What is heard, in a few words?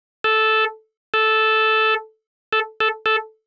opl2